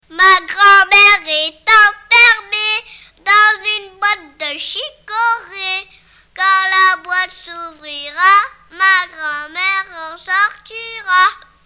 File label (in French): grandmere, chants; Ma grand-mère